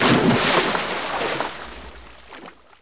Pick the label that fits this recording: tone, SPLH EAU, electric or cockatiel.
SPLH EAU